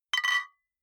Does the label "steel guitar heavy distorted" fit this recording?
No